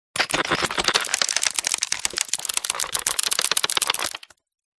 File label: science fiction steam punk machine operate clicks gears; audio mangler